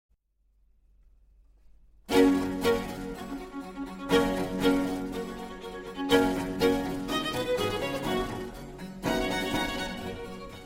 Sinfonia Pastorale in G major for 2 Violins, Allegro